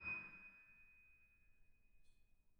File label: harm D#7, Grand; bitKlavier; Binaural